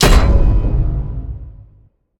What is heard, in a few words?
impact metal; Smite; Cabrakan